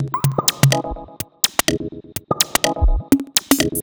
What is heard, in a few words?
125bpm, House Drumloops